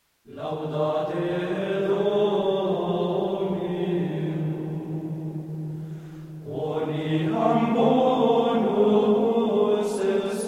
Altspanische Gesänge - Lauda Laudate Dominum, Gregorian chant; Abadía de Santo Domingo de Silos; quoniam bonus est